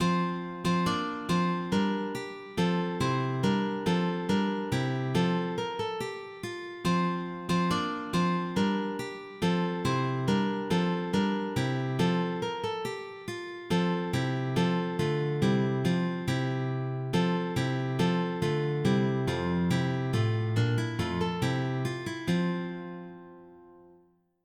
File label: Chorea; Reinwalch